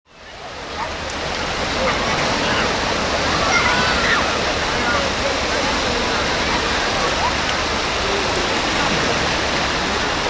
Mer plage foule

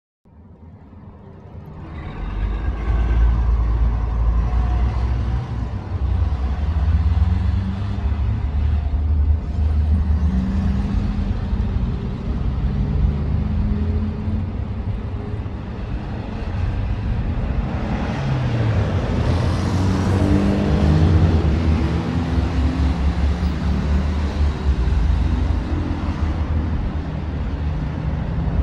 Circulation en ville

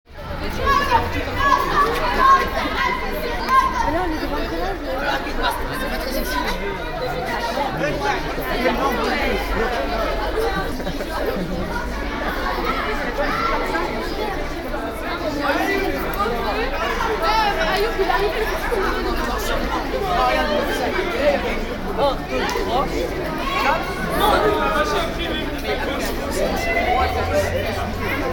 Foule adolescents

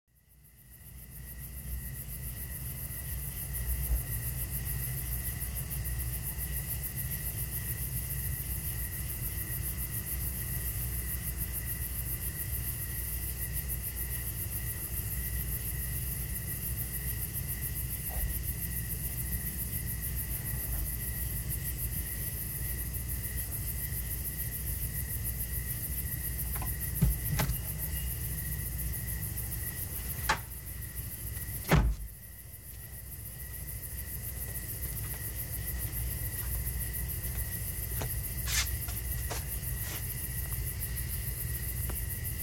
Nuit Sons

